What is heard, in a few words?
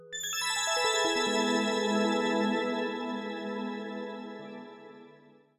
Course Clear Filter; Super Mario Maker